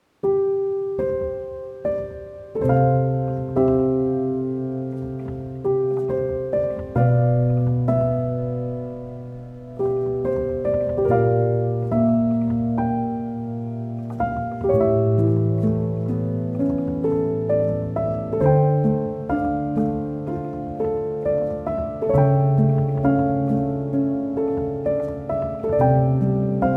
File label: Piano Version; From Up On Poppy Hill